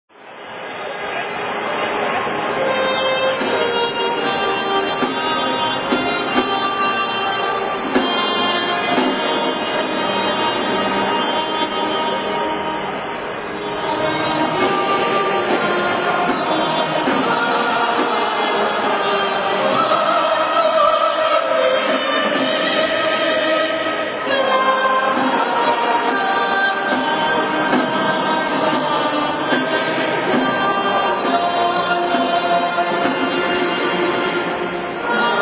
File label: welsh national anthem